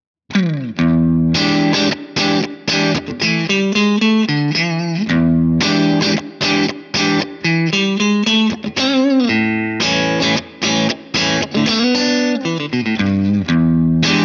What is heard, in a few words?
breakup; jensentone